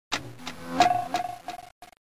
Echo Sms

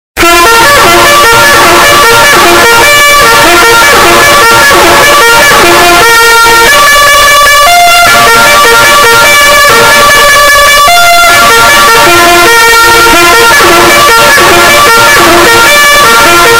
Reveille

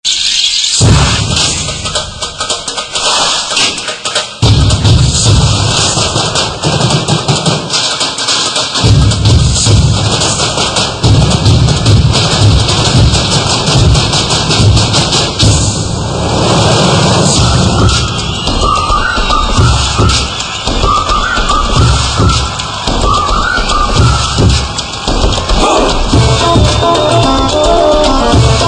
Fast Beat; Dhoom

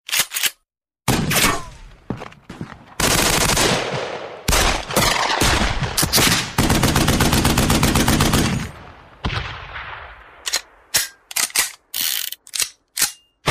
Gun

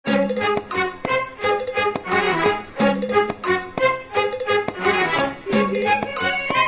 Instrumen

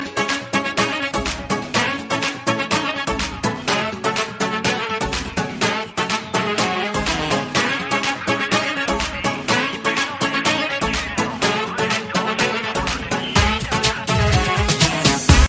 Turk Music New Song

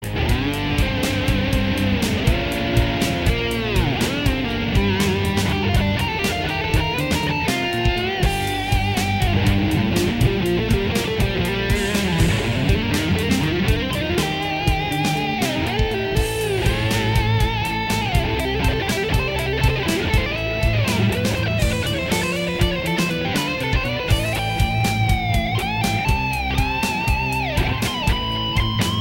Solo

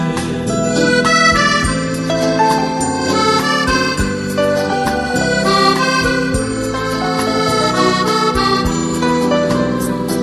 italian love song

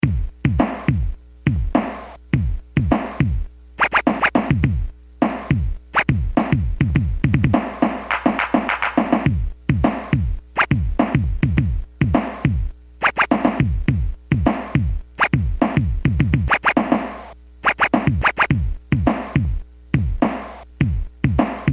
Elevator Beats

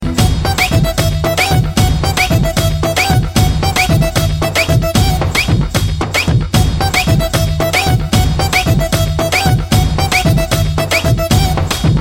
Music

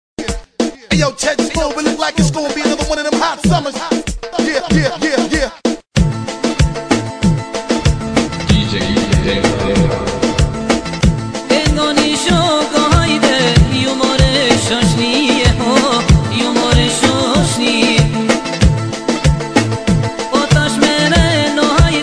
Tallava Remix

